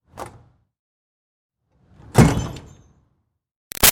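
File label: Door Metal Commercial Pull exterior Hard open-close; Metal Doors; BigRoomSound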